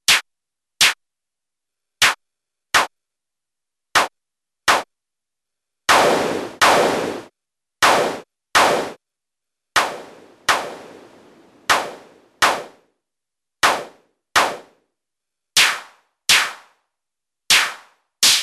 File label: MODOR DR2 CLAPS